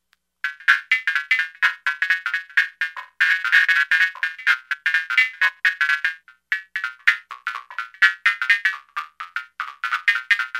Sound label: House & Ele; Factory Patches